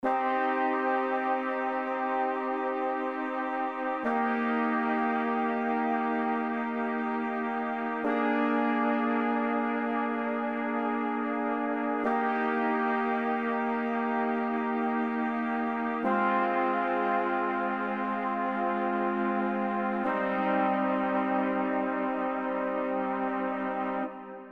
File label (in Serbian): akordi limeni duvaci mol